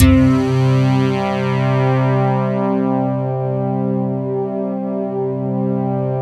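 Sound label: JV heaven guitar, JV-1080; Syntec; Wall of Sounds VOL-2; BASIC-JV1080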